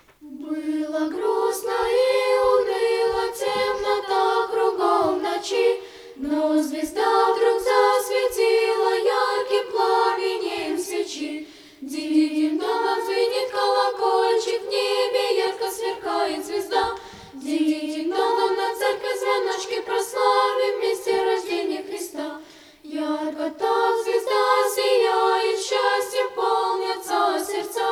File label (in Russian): Колядка, Звенит колокольчик